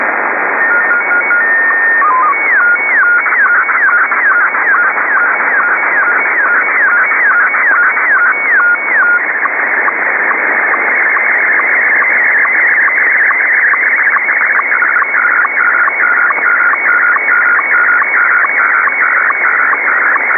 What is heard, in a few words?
sstv